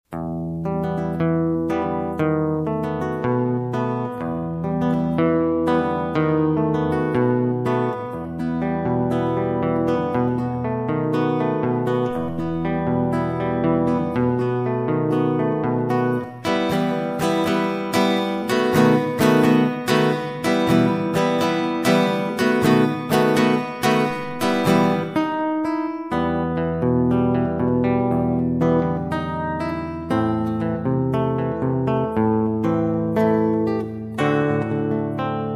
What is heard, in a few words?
bolero flamenco